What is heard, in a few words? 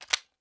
Click